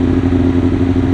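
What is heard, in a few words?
low loop, Engines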